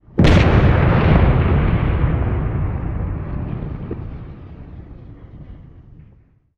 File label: damage hits explosions